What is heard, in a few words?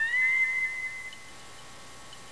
sick bay